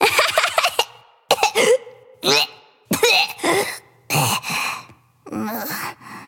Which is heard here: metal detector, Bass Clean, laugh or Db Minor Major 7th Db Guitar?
laugh